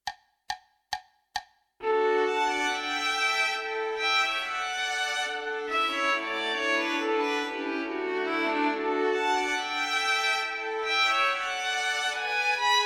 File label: gavotte; minus Cello